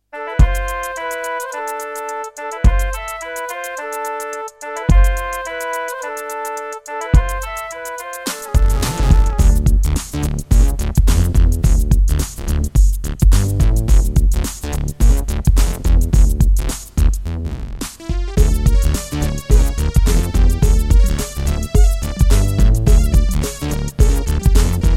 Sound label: Serdaco Yucatan FX, Turtle Beach Cancun FX Clone; Lost Vikings